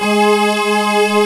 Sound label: LINEAR SYNTH